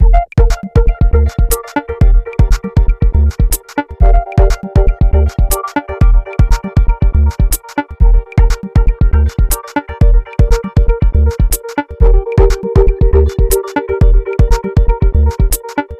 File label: Vocoded Beat